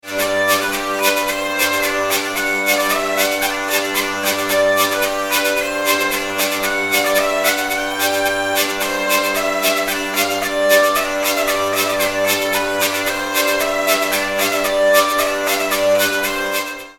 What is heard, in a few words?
bourrees